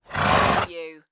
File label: Horse Snort; Sound Effects Bible